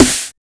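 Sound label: 909 snare; TEK; Drums In Your FACE!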